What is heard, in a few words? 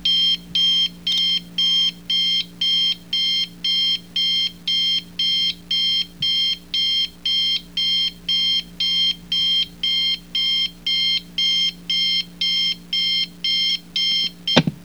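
ALARM CLOCK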